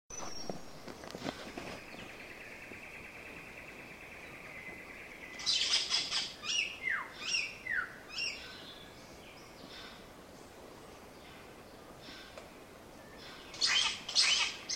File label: Scenopoeetes Dentirostris, bird species; Tooth-Billed Bowerbird